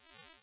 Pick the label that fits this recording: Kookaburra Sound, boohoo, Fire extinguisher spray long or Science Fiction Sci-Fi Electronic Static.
Science Fiction Sci-Fi Electronic Static